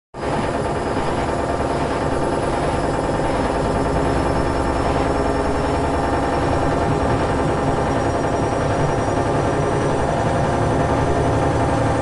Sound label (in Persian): صدای بلبرینگ ماشین لباسشویی; علت صدای زیاد ماشین لباسشویی